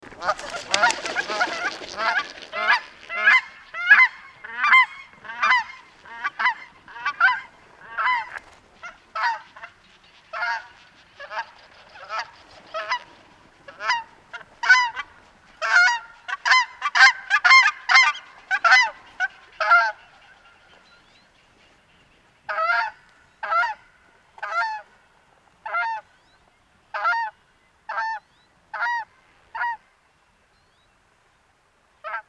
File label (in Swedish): Dvärgkanadagås